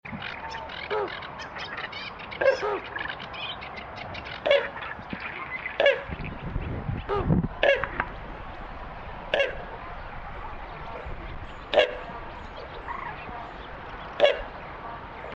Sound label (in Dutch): Knobbelmeerkoet